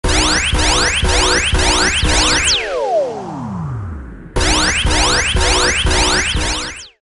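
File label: Military Alarm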